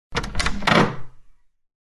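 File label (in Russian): звуки дверей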